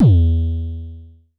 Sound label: Dist Kicks E2, Vermona Kick Lancet, Distortion Kicks; LookIMadeAThing Kicks